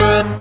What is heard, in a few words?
Synths G#; Vengeance Samples